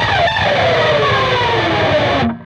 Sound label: PRS SCRAP, Guitars; PRS SCRAPES